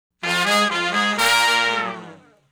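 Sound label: Shuffle Blues Riff (Bb) unison falloff, Horncraft for R&B; Crimson Blue and Fabulous Horncraft 4 RnB; Sections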